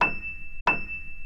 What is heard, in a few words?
E7 mezzo; pianosound